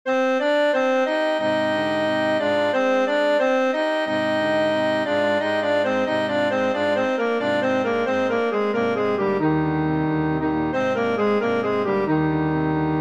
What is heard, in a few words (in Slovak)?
Na prievoze